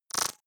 splutter short, zaps buzzes

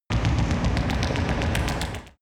extrinsic buzz low, energie

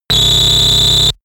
overloaded blaster; laser; soundpool

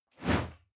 whoosh classic

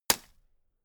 gun shot silencer

